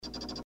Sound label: fast 5 clicks